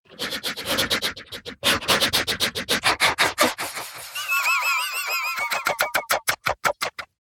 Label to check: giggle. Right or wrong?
Right